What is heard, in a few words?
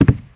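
footsteps carpet; Spearhead Demo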